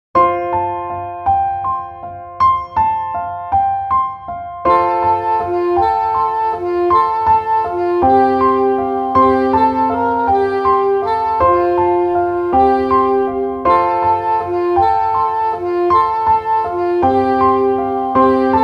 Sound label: fl cl cor